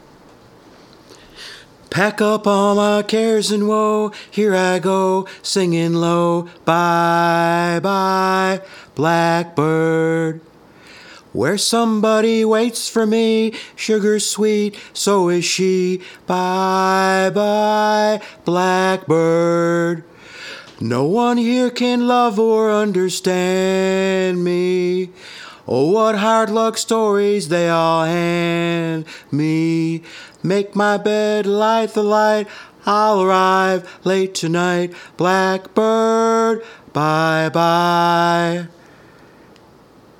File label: Vocal